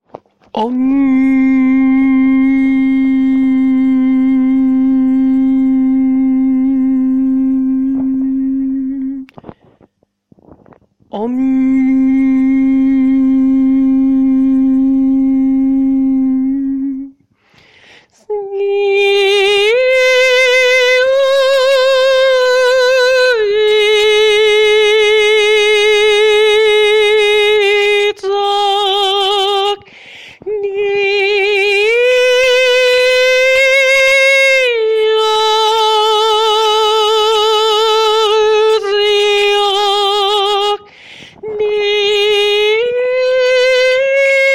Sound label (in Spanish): coro sacerdotes y sacerdotisas